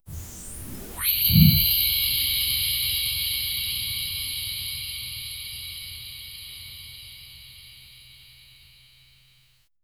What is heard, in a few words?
the sound of a ball, energy